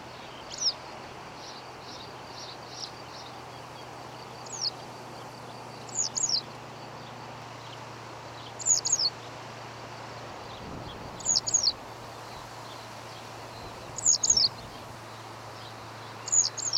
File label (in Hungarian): sarga billegeto hangja